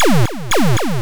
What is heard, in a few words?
Close; Space Duel